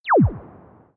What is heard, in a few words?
button